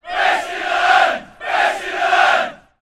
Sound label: human crowd soccer x150 shout best in the land x2; sound effects one